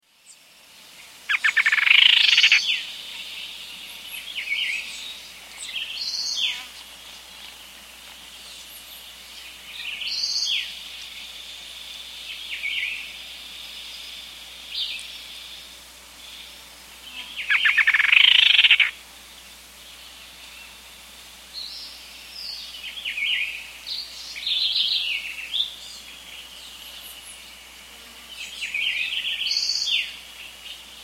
Rufous-Winged Antwren; S. America

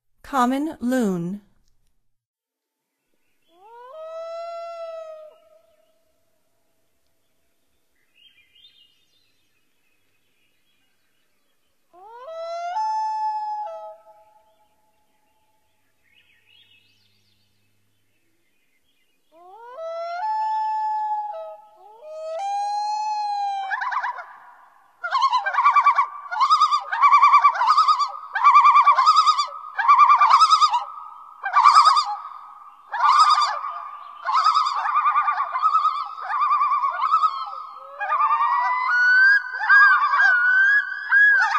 Common Loon, Songs of Birds of North America; Stokes

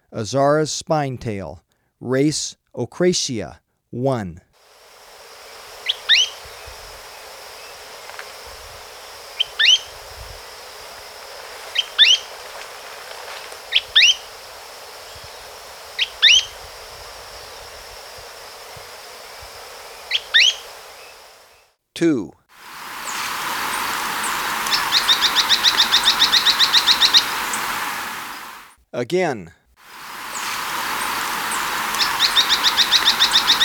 Azara's Spinetail, Birds of Ecuador; S. America

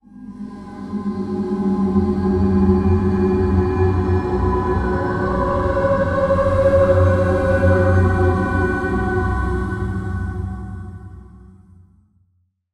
ELECTROCUTION

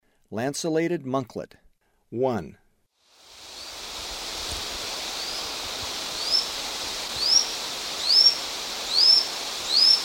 Lanceolated Monklet; S. America; Eastern Lowlands

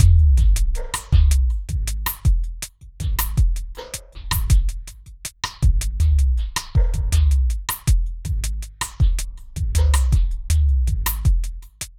Ceremonial, Tribal Fusion; Ambient Cinematic

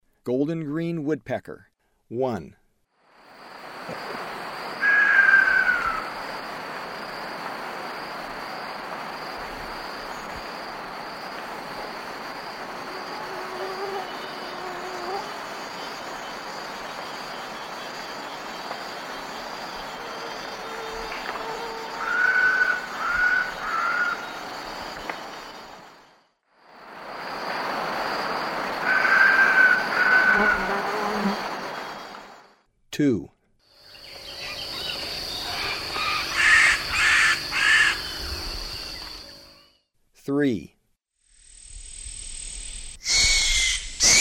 Golden-green Woodpecker; S. America; Eastern Lowlands

